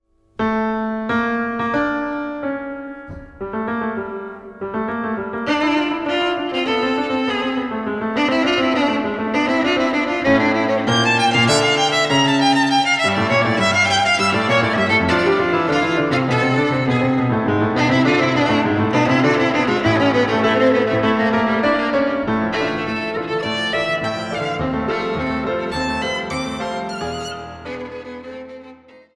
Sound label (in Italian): Suite per violino e pianoforte Intermezzo; Alessandro Longo